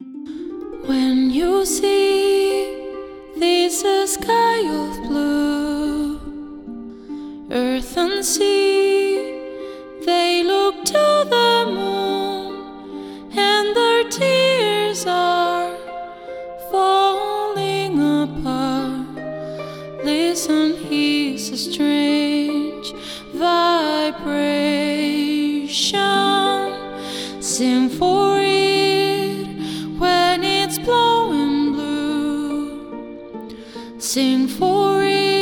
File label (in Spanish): arpa voz; noahmund